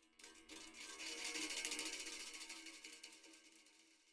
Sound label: Spring Drums